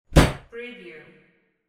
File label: door close